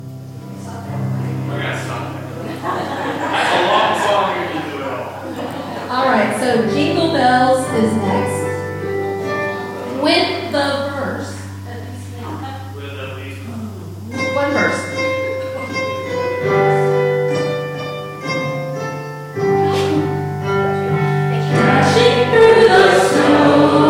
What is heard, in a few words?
Jingle Bells, Carol Sing; Secular Songs; Bethel UMC